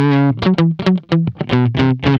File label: GUI, LICKS; 110 LICKS